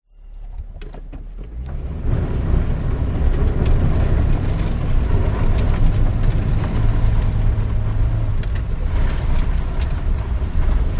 vehicles car suv away hard wheels spin drive fast stop skid dirt track internal